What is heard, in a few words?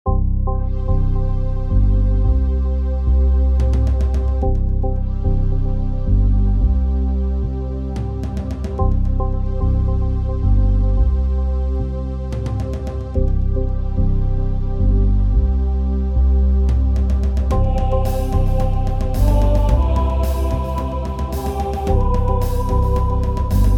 atmosphere; Horace